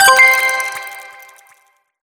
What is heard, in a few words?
Checkpoint